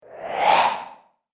Whoosh Air Build Up Fast

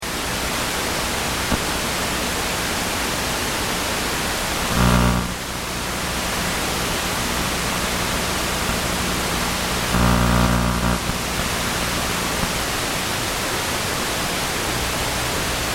Sony Tube TV Static Super Wide Snow Hum; TV or radio bad reception, wide stereo image.; Noise Sound Effects

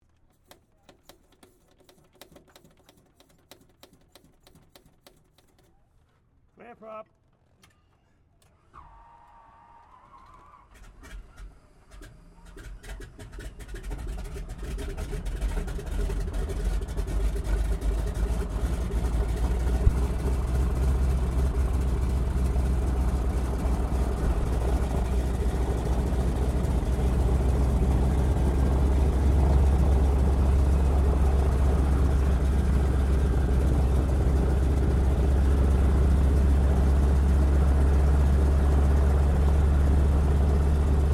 AIRCRAFT BOEING PT-17 STEARMAN STARTUP INTERIOR; PT-17 Stearman Trainer; Airplanes; Sound Effects:Vehicles

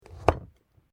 PUMPKIN CUT; Various food preparation; Punch And Hit Sound Effects; Punches and Hits (Sound Effects:Combat)

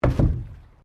PLASTIC BARRICADE IMPACT

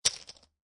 Poker Chips Dropping On Poker Chips

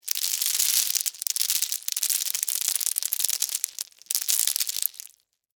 Debris Lima Beans; Texture; Debris Sound Effects; Materials; Blast Wave

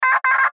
Radio Morse Code; Weak Signal Letter G; Warfare; Blastwave

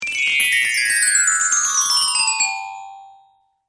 Chimes High To Low Slow Delay, Windchime; Twistedtracks

